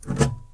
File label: point; knife flip